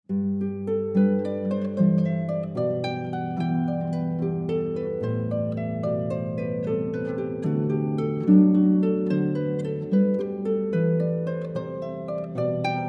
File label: harp flute; jesu joy